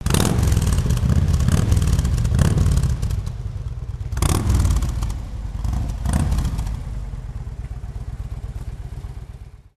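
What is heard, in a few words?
motorcycle harley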